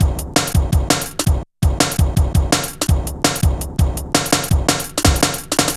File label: Bouncy, Jungle, Drum Loops 166.5